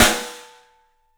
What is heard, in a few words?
STEEL S, drums; 3D Sound